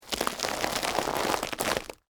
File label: Texture, Crunch, Footstep, Grind, On, Ramen, Noodles; Crunch Sound Effects; BlastWave